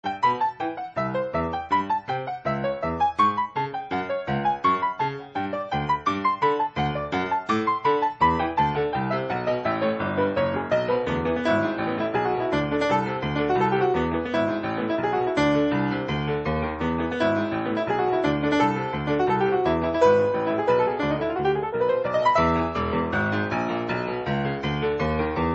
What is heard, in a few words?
grand piano; M.U.L.E.